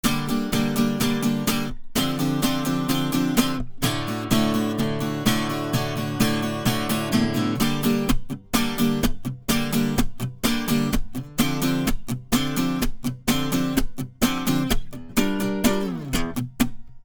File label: Subsonics Ac Gtr